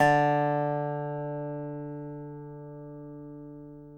DOBRO NOTES; Sampleheads; Whole Lotta Country 1 & 2

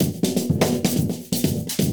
Percussion Loops, Brazilian; Houseworx

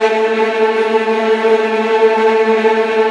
STR TREMOLO, Strings; ILIO; Kirk Hunter Virtuoso Strings VOL-3

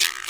REV Guiro

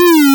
Drop, Bass

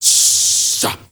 MALE RAV, MALE NOISE, Heart of Africa

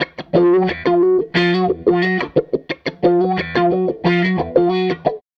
Strat Guitar Loop

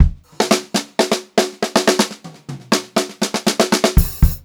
FUNKY FILL, Funk-Groove Drumloops; USB Soundscan vol.38; AKAI; 90 s Sample CDs

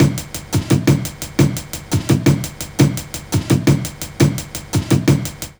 LOOP, Jungle

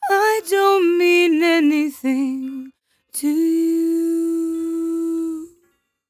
57bpm Am, Velvet Vocal; Zero-G

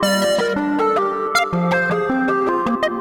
Mr Machine Again, Synth Sequences

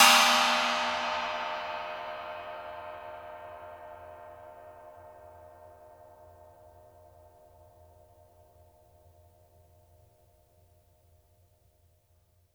PANG STICK, cymbals; Sampleheads; New York City Drumworks VOL-1

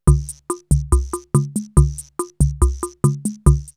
CR78 R77 BeatBox, drumloops, DrumMachines

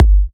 G, Kicks; markus hakala

